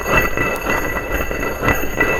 Scrape glass medium

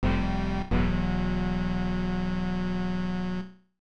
Lose In Battle; Tenchi o Kurau: Sangokushi Gunyuuden; SNES

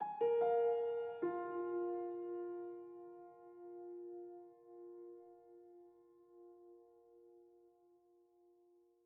Env Field Night Ground

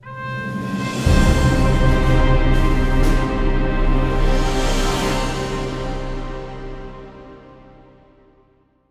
Multiplayer Fail

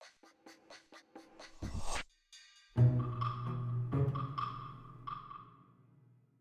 Field Battle Minus Easy

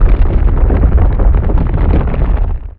door; Shin Megami Tensei IV Apocalypse; 3DS

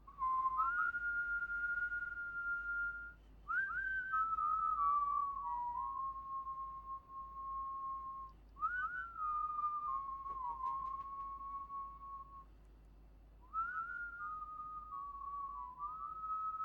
Whistling; gamerip; door to door

